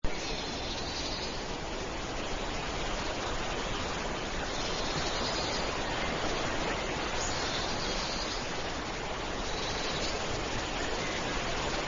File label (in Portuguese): ambiente vento